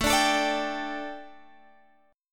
A 7th Suspended 4th Guitar Standard, chord; x,12,12,14,15,15; A7sus4 strummed; capo=3

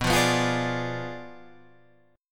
B Diminished 7th B down Guitar Standard; strummed; JGuitar

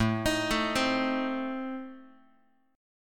A Diminished, down Guitar; Adim arpeggiated; x,5,6,7,4,x; JGuitar

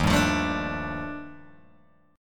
Eb Major Sharp 11th Eb Guitar Open G

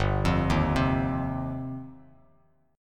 G 7th Suspended 4th Sharp 5th, Bass Standard; G7sus4#5 arpeggiated

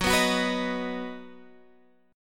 B-Major F sharp

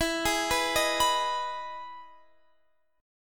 E Major 7th E, Guitar, Drop D

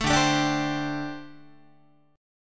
D 7th Suspended 4th, down, Guitar; D7sus4; strummed

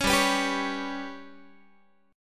G Major Flat 5th Guitar, chord; GMb5; strummed

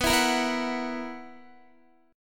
B Major 7th Suspended 4th B down Guitar; BM7sus4; strummed

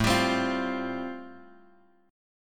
A-Minor 7th Sharp 5th, Bass Standard, chord; Am7#5 strummed; JGuitar

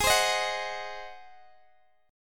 Ab 7th Ab down Guitar Open G; strummed

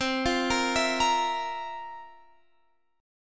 F Major 7th Suspended 4th C

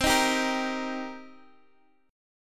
C Minor 7th, Guitar, DADGAD; down; chord shape

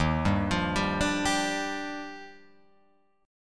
Eb Major 9th, Guitar Open F; EbM9 arpeggiated; JGuitar

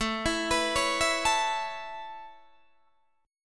A Major Add 9th A Guitar Open D, chord

